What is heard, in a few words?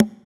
Conga; Equipped Music; Loungin House